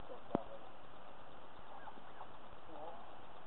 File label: Dog barking